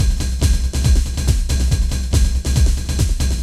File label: techno 140